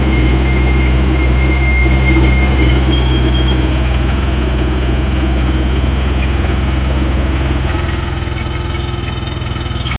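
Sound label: Indian style heavy construction, machinery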